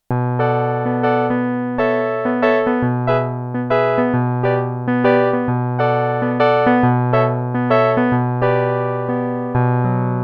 Piano, Roland JX-8P

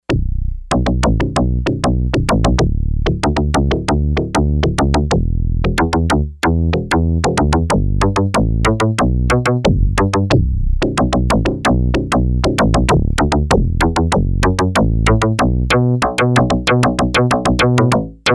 Sy.Bass, Yamaha DX11; Factory Preset Voices

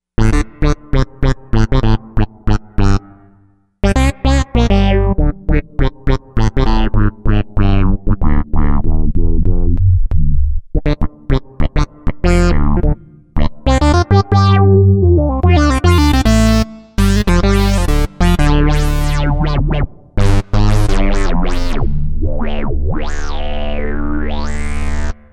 Pincher Funk

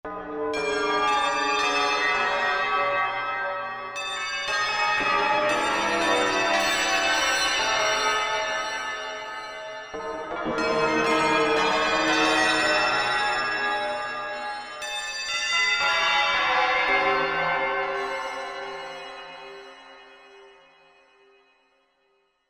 Crazy Bells, Percussion

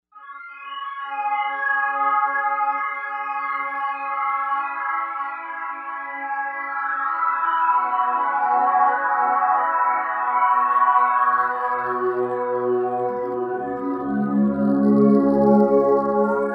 Reso Haunt, Novation UltraNova; Factory Patches

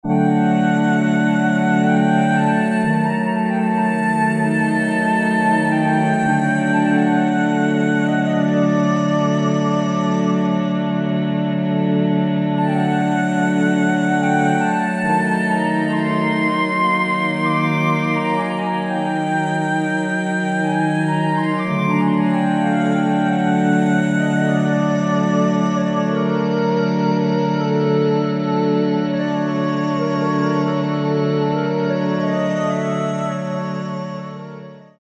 Korg MSC-6S Fretted Insts; Afterwards

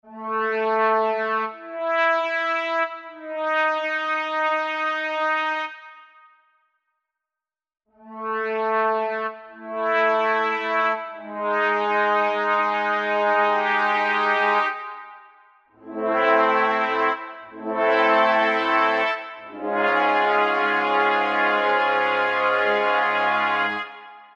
Brass; Sonic Reality Modern Songwriter; Cres Brass B

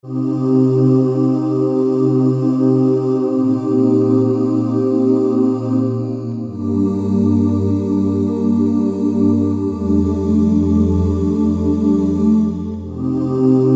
ohh choir; IK Multimedia; SampleTank Free

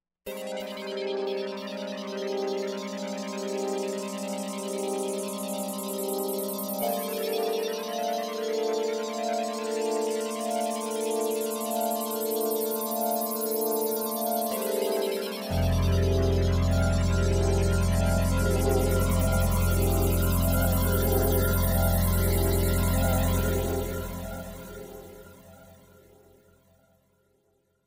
Roland V-Synth; Pulsactive; Factory Patches

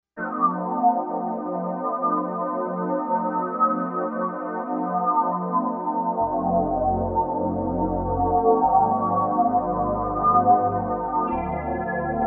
V Pad

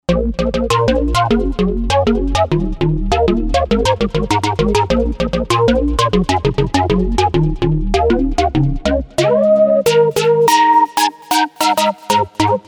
Bass; Novation UltraNova; Factory Patches

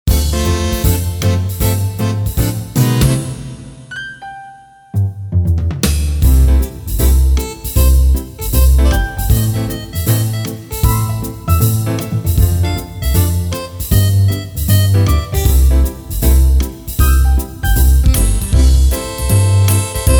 Fox Trot, Casio CTK-601

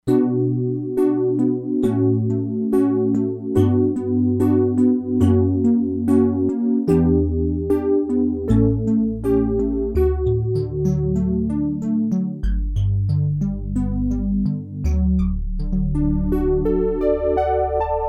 DIGITAL PIANO, Crumar Bit 99; Factory Programs

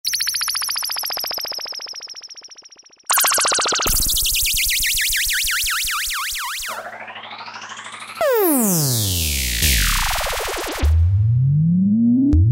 SYNTHFX; Best Service Alpha Dance II

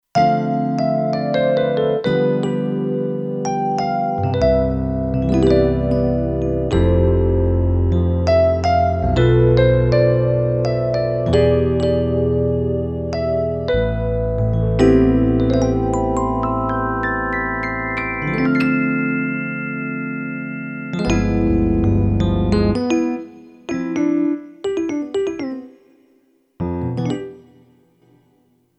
Grand & Elec, Kurzweil K2500X; Factory Programs

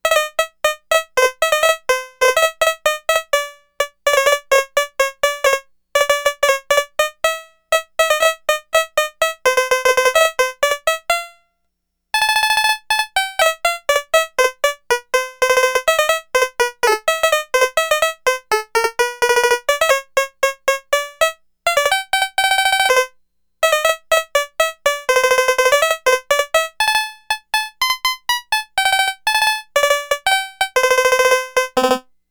Banjo, Roland SH-2000

